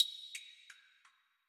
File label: tick